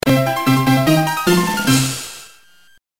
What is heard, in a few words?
Beat Boss; Barbie and the Magic of Pegasus